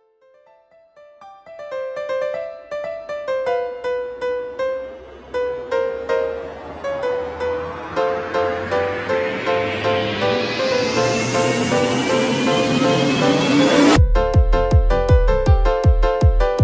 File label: Bass